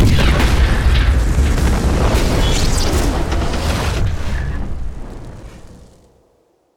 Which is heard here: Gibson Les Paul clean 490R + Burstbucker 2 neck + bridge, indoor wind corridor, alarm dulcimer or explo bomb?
explo bomb